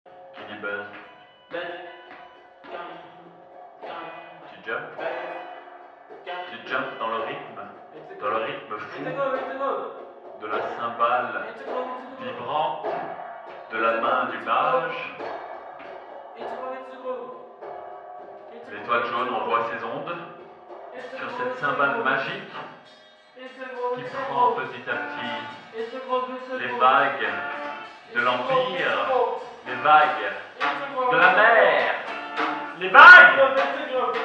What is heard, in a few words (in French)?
vocal dans la plus pure tradition